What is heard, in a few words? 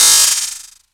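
fx Electric; Akai MPC5000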